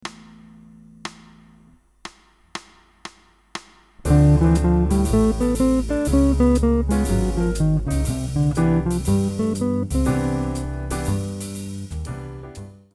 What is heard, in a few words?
guitar scale exercise example